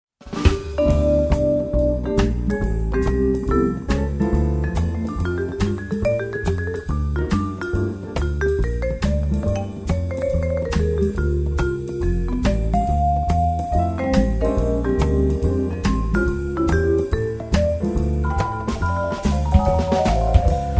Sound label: Blues